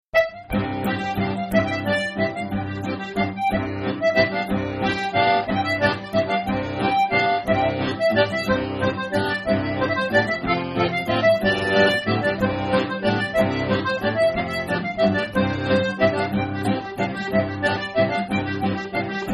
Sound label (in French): bourree; de Saint Claud